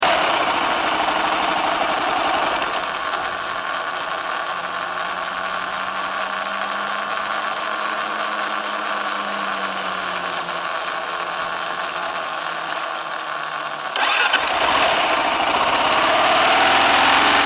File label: KLR coast; KLR650; 25mpg